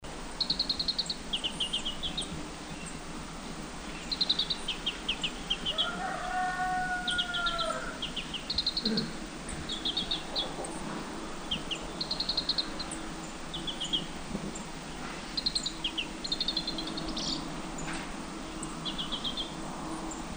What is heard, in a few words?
Grey Capped Greenfinch Ssp Sinica Call; verdier de chine carduelis sinica ssp sinica; Guangxi Shanli